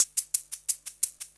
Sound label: Shake 175, Drum&Bass Perc Loops; EZRollers